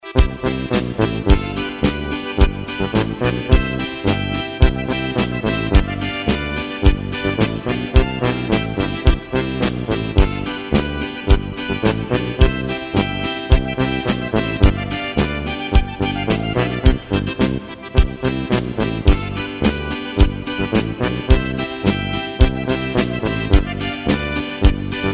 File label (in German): boarischer; Stimmung VolXstuemliches